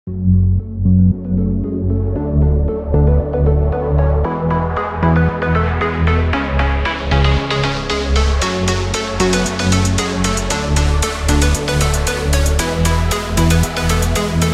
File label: neon mirage synthwave background music